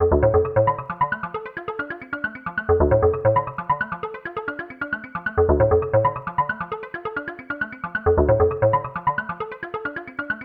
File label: PLUCK Plucky Mallets